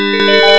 Bell3 short, Bell alert sound; AOL; Alert Beeps

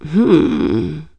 hmmm; REDNECK; RAMPAGER

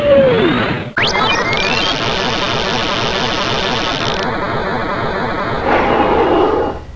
zoom; How Many Bugs in a Box; juicebar

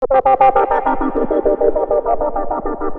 SYNTH D+B 160 C, Drum+Bass, Keys; eJay

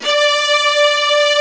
Arco Violas D3

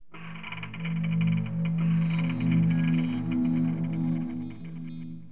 horror, keyboards; HipHop; LiveAct Pool 1

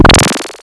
Bomb Use; Space Debris v2.1.1

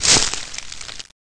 Crunch

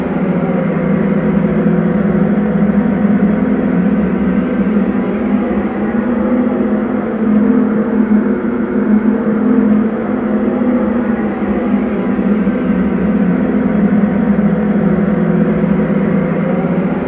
spooky

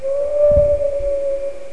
Whistling Wind; Curse of Dragor Demo

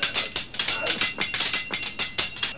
SWORDS

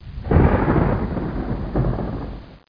T CLAP; TCLAP2

